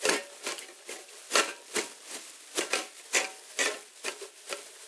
Cans In A Bag Metal Loopable; Nova Sound

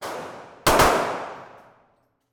Execution Style Return Fire; Nova Sound

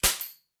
C02 Shooter B Gun Shot Fire; Nova Sound

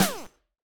Lazer Snare; Nova Sound

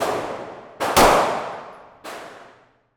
Shot Shoot Out Gun Fire; Nova Sound

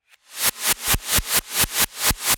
C02 Rapid Gun Fire Reverse Reload; Nova Sound

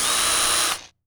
Electric Drill

